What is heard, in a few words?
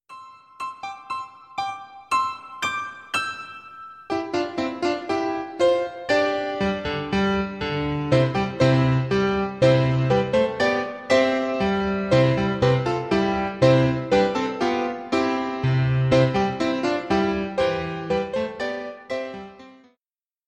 Flute Christmas